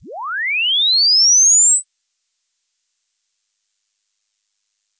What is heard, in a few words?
chirp A diff